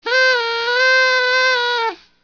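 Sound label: horn party; Office; MSOfiice95RUS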